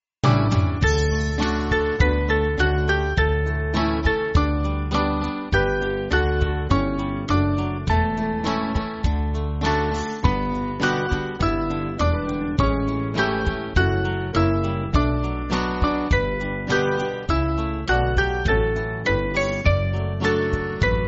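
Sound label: Band, Music Backing; Dear Lord And Father; Repton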